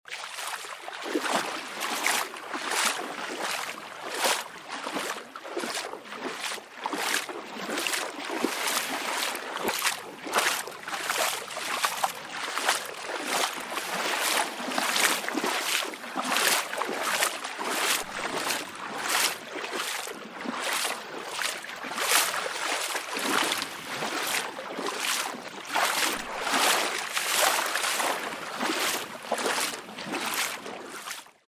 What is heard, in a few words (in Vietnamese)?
tieng nguoi di bo trong vung nuoc dong; the language of people traveling around the country